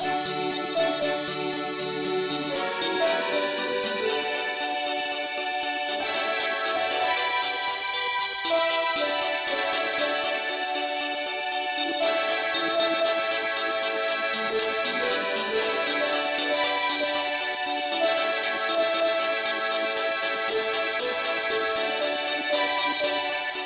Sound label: uke lead key d